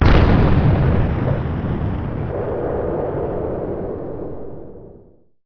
xplode; PC Gamer IT CD 41

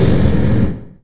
brake; machines

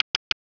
Mac double click; K-12 Interface Study

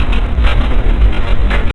Indus; PCGamer50

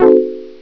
comp buzzin; Medley 2.0

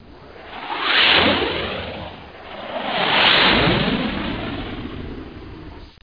plane globe

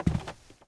carpet; ambient; Shadowman

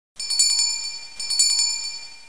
sbell

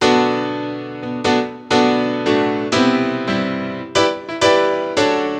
Synt

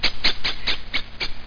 Birds

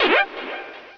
squeak; Rolly

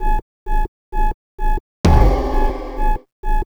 space choir bpm130

